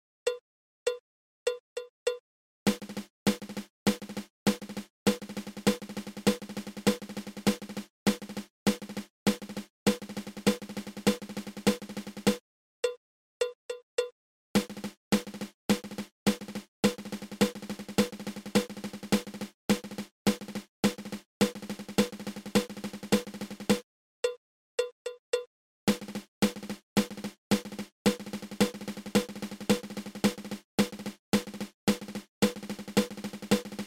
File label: Single Ratamacue